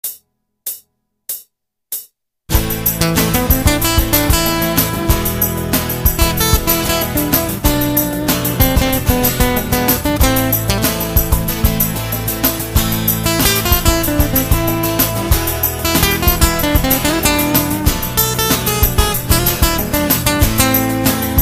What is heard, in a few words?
lick, guitar